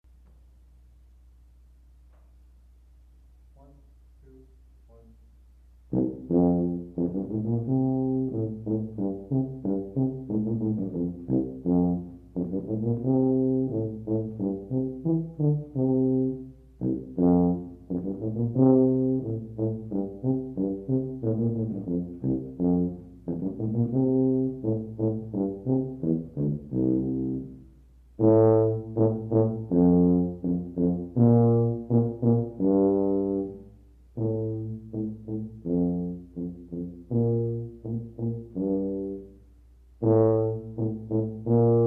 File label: tuba; Hunters Chorus